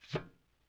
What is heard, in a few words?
Plunger Ploink Metal; Vintage Cartoon; Foley